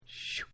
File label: shoop